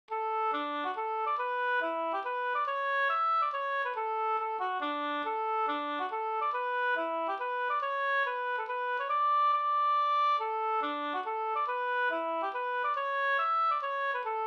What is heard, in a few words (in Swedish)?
polska Treparsdans; Sodertorn